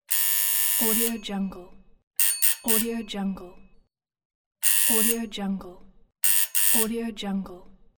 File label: door bell